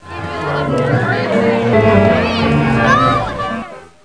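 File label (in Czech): obcerstveni, refreshments; pavouk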